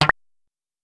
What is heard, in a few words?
electric pop